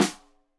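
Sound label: Snare Stereo, Drums; Deeply Sampled Drums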